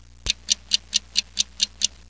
clock

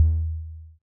ele Bass; Mistergenerator

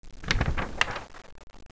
PAGE FLIP

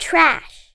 TRASH

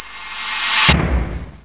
TURN ON, DRUM MACH; Leonardo The Inventor; Broderbund; Riverdeep

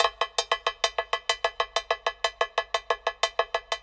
PERC LOOP ETHNO 125

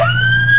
Whale

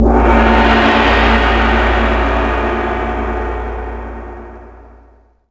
gong; Shadowman

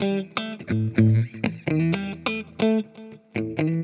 ELEC GUIT ETHNO 125 A, Guitar; eJay

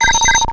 button

